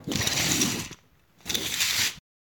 Curtain Opening And Closing

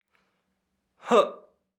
Breath out before jump

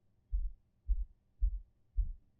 Low Pitch Knocking

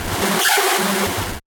Virus Screech

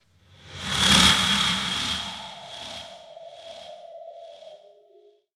Strange Appear

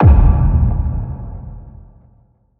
Sub Hit

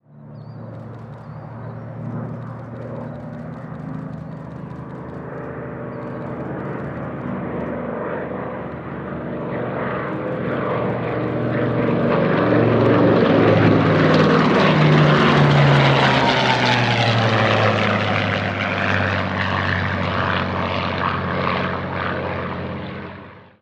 A6m Zero Chased By P 40

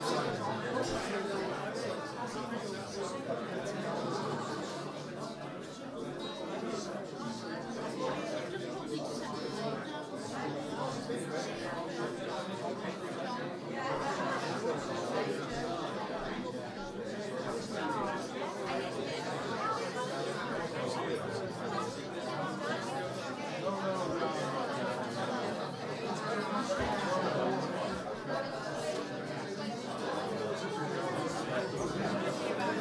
Crowd Ambience At Live Gig

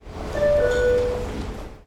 Ding Dong

